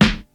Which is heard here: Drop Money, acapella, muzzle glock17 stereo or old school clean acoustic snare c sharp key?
old school clean acoustic snare c sharp key